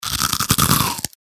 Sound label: eating chew crisp